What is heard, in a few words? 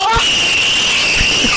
goes brrrrrr